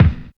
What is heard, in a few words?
present bass drum D sharp key; kick drum